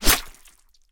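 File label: chopping off a fish's head with a big knife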